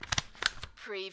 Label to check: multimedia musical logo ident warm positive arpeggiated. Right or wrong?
Wrong